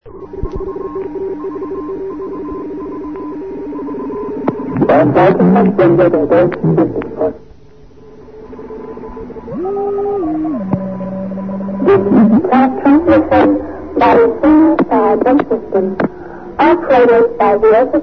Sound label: usb; europe